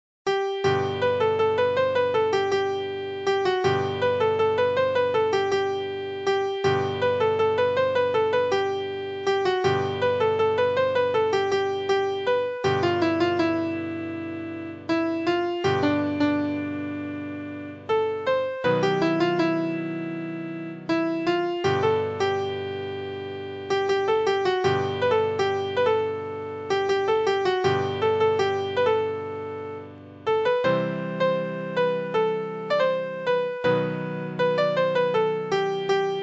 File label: Piano